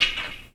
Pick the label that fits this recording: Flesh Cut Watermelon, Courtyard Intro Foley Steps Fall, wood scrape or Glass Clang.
wood scrape